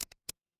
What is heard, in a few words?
ui click